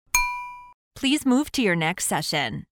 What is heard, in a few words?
short ding please move to your next session